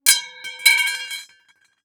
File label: Impact Metal Pipe Hit And Bounces On Cement